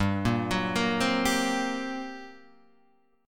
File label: G Minor Major 7th Double Flat 5th G, Guitar, Half Step Down